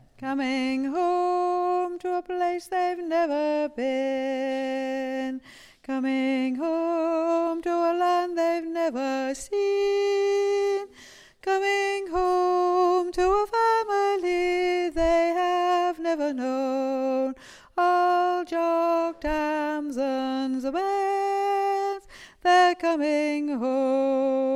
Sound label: bass chorus; coming Home